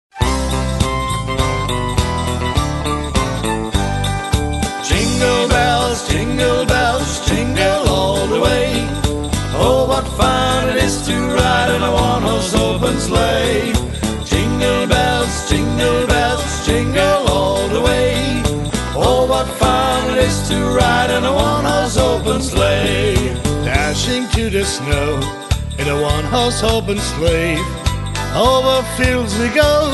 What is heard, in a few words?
Jinglebells